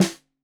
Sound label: Snare 65 Reg Stereo, Deeply Sampled Drums